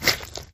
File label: step